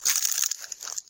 ice broken

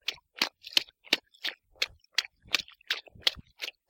footstep rain

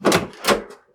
door open

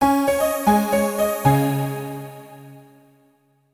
sound logo

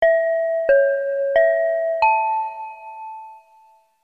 callbell

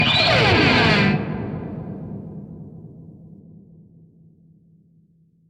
speedy

